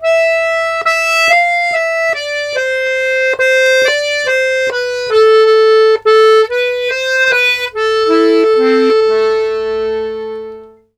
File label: Complete Accordions; USB Soundscan vol.40; AKAI